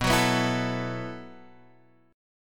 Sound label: B 7th Suspended 4th B down; B7sus4; strummed